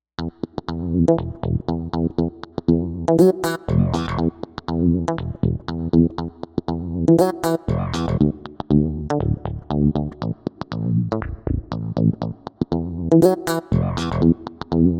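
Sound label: Bass FUNKY THUMB, synth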